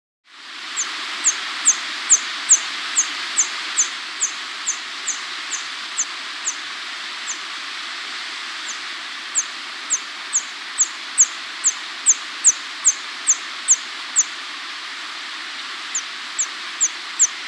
Wedge-Billed Hummingbird (Schistes Geoffroyi), Andean Birds; S. America